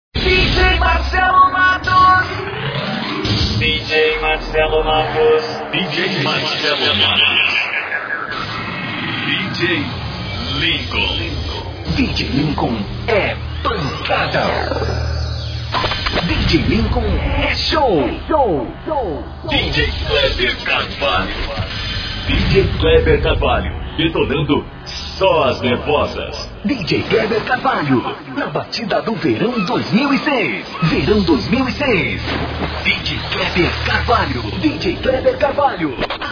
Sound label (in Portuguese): vinhetas djs